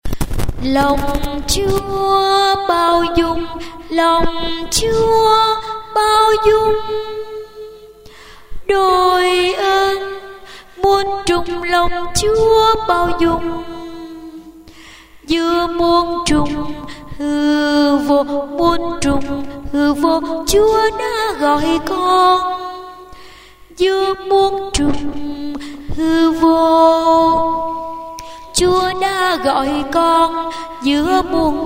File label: alto